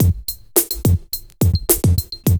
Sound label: LOOP; E-Lab; X Static Goldmine 2; akai